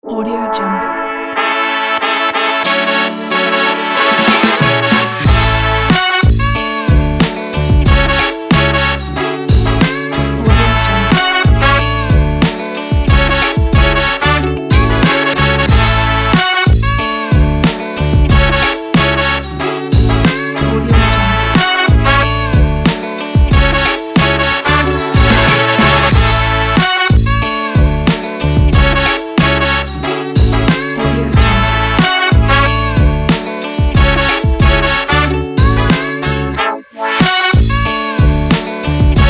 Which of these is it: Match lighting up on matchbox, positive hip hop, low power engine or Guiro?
positive hip hop